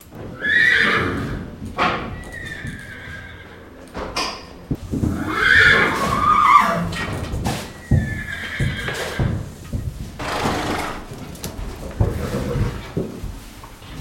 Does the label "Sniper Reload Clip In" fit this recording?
No